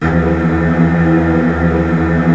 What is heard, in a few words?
E1, Strings, STR HLF TRLL; ILIO; Kirk Hunter Virtuoso Strings VOL-3; 90 s Sample CDs